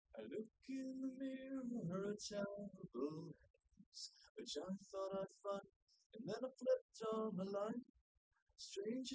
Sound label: nodrums liverec; SiSEC08; SiSEC underdetermined